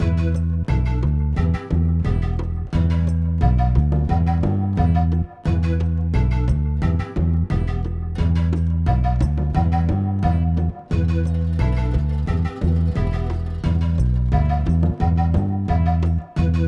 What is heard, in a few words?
drumulus singalongus E